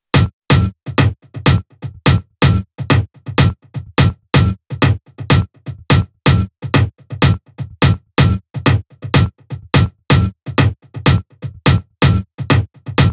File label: toms